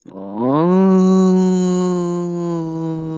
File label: aughhhh; meg